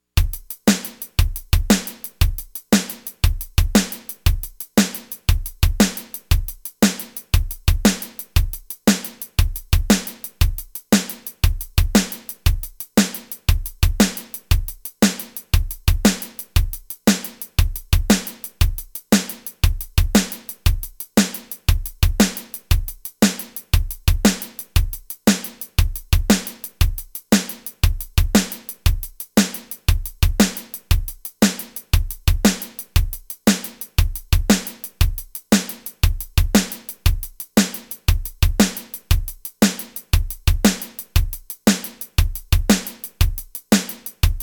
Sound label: Shuffles Scuffles, tap